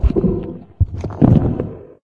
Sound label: heart loop, hydra; scmod